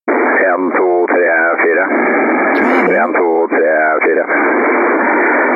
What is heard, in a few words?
dsp compr clipper test